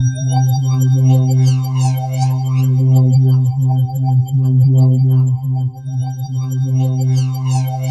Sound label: C3, SynthLine; 90 s Sample CDs; NiceBeats; SynthLine VOL-5